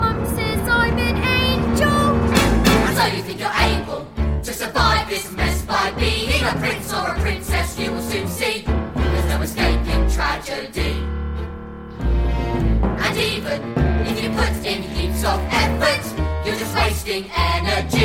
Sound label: school song vocals